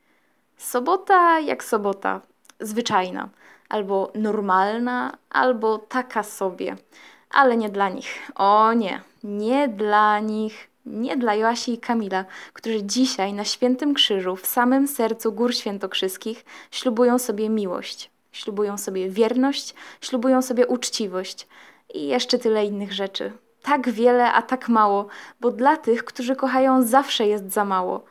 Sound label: vocal